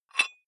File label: dumbbell thread take off small weight quick; sport freizeit; fitnessstudio